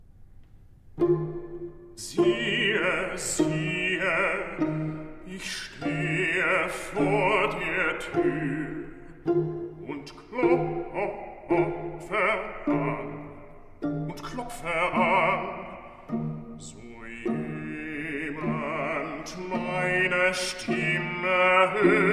Recitativo Cantata; Nun komm der Heiden Heiland BWV 61; Johann Sebastian Bach

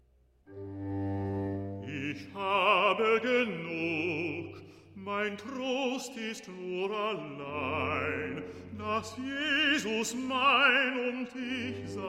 Recitative; Bach; Cantatas I

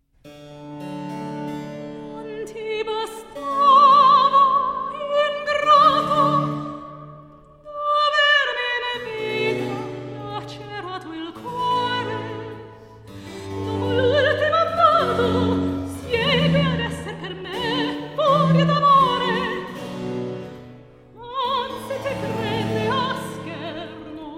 Cantata - Recitativo; Il Delirio Amoroso; George Frideric Handel

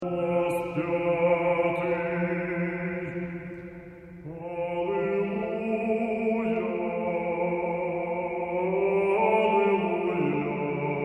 Alleluia, Chamber Choir; Liturgy of Peace; Kyiv Chamber Choir; De Angelis

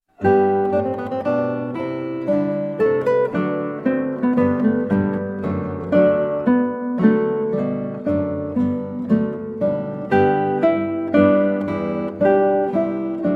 Adagio from Flute Sonata in G Major, Guitar; HWV 363b; Handel; George Frideric Handel

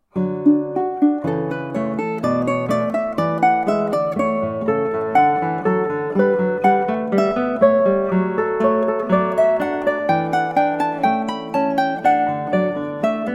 Prelude, Guitar and Ukulele; JS Bach; Johann Sebastian Bach

